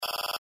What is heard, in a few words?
Electronic system sistema electronico; Sound Graph